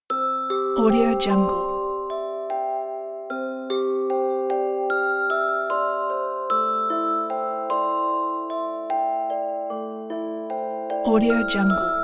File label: baby lullaby